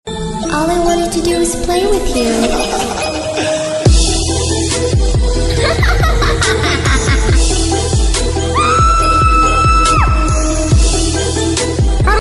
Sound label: halloween theme song remix